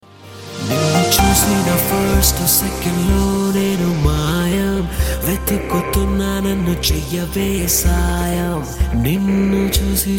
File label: Nokia Ringtone